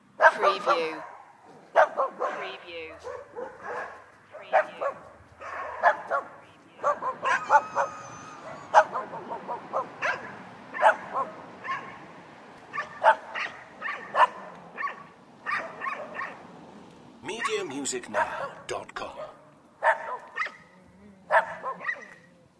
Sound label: neighborhood guard dogs loop